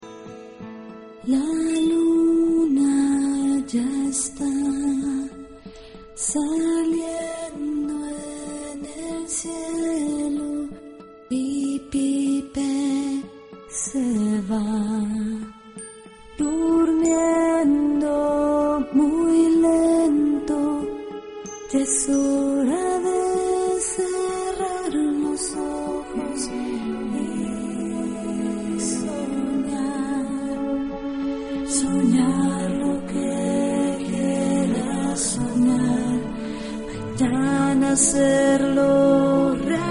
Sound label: pipe